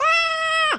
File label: ahhh scream; roblox